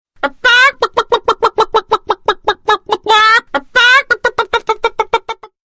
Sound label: Chicken Voice